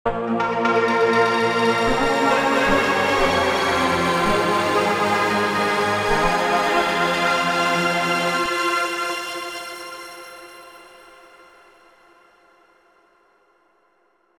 Grain Delay; Sound Design

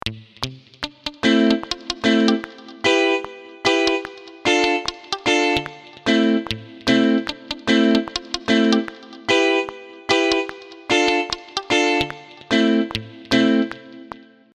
Riff

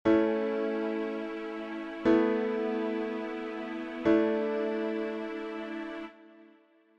A sus chords

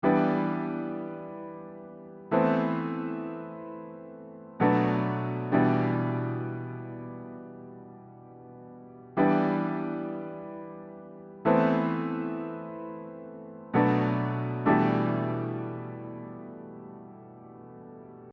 Rnb Hip chords

